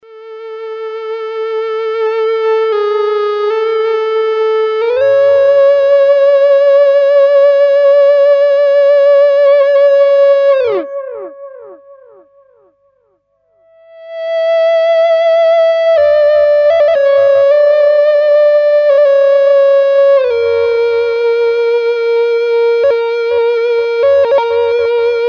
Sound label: Delay ebow, guitars; Delay Llama